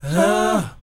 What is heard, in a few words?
BOYZ F#, Vocal Planet R&B & Dance; ILIO